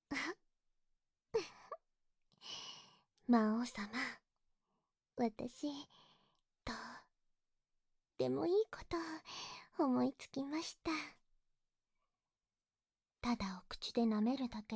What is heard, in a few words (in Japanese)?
両耳舐め（バイノーラルマイク 効果音なし）, ASMR